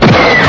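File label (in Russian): мультяшный звук запуска реактивного снаряда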